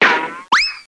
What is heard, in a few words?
Laser Lab Sound; Zap!; EdMark Corporation